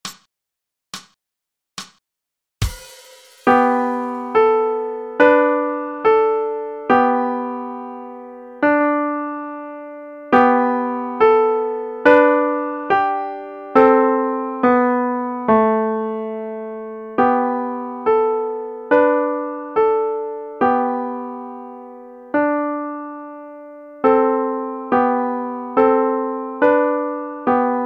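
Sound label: 70, Pianoforte; Mua vui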